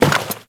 Worker Step Default; Warframe; Characters; Solaris

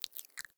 Foley Mouth Move; Enemies; Warframe; Catbrow

